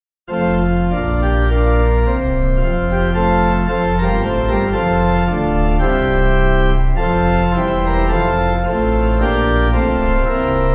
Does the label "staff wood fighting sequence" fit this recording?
No